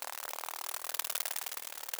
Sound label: hornet idle